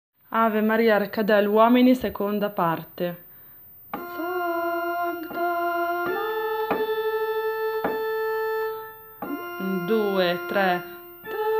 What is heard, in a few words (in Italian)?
Ave Maria uomini; Arcadelt